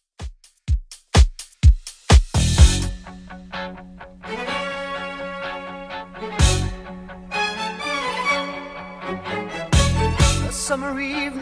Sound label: 80s Redrum 126bpm